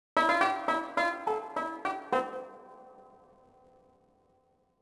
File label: banjo; Splashdown; XBOX; Soultree